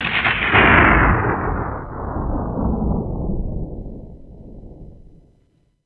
thunder

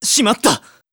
voice damage

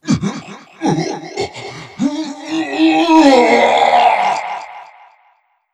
voice monster

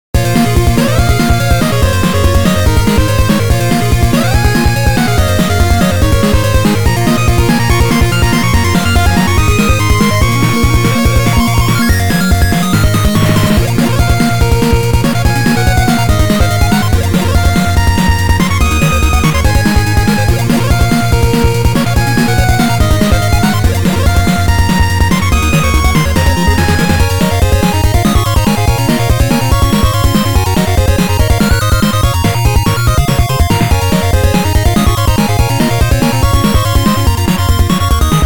8bit STAGE

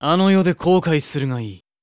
voice attack

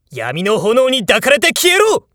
attack, voice; jakigan

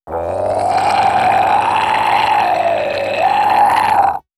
zombie growl

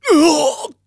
voice damage

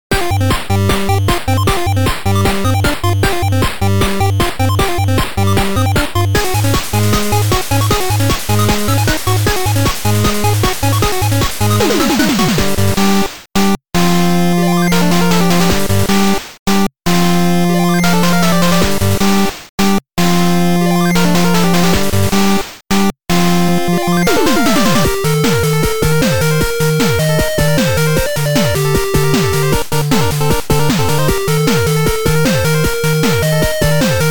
8bit stage

